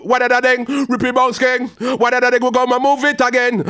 ragga in B phrase

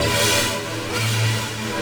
Guitar, Drum N Bass; Sonic Foundry (Sony Creative Software); Knutrix Drum & Bass

